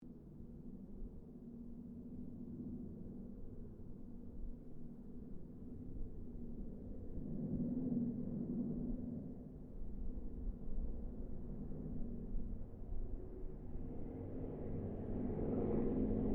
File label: AIRCRAFT HARVARD SQUADRON 3 PASS DISTANT; Mixed aircraft squadrons; Airplanes; Vehicles